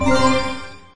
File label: Card Rotate